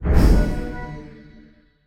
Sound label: level up